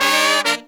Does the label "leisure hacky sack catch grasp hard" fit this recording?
No